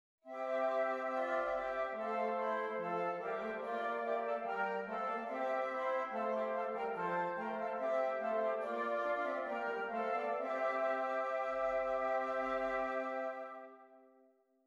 esercizi di doppio contrappunto nella decima a quattro; corretto

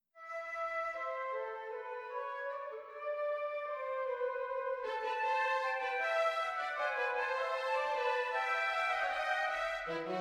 Orchestrazione; Ronge; Gerd Prengel

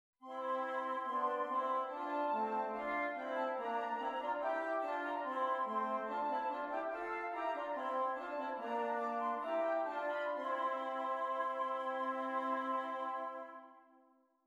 esercizi di doppio contrappunto nella decima a tre; Ronge pagina 182

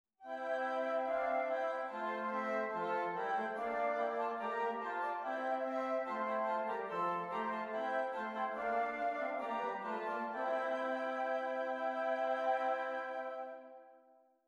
esercizi di doppio contrappunto nella decima a quattro; corretto

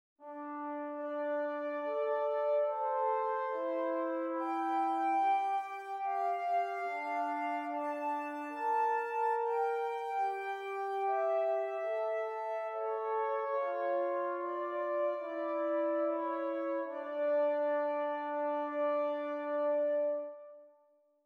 Esercizi di contrappunto a due voci; viertes Gattung; Ronge pagina 60; corretto

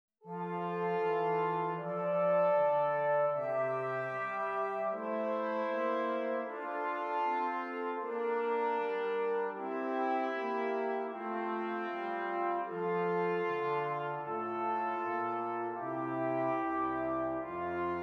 esercizi di contrappunto semplice A tre voci; Ronge

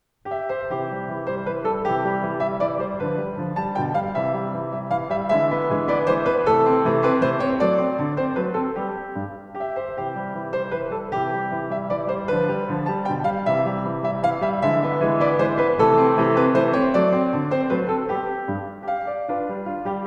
Valzer, pianoforte; von Joachim Hoffman

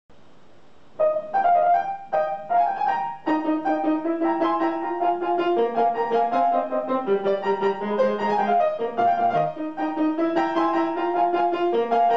Presto per pianoforte; Woche M Seite 48 del Sigre Iunker

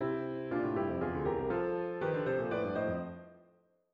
Appunto per pianoforte

